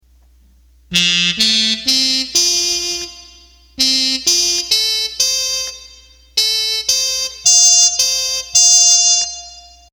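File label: Muted Trumpet, Roland accordion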